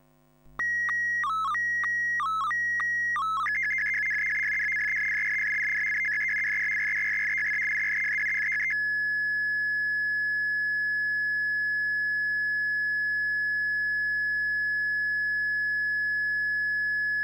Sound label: ATV24 Narrow, SSTV Signals; hamradio; AVT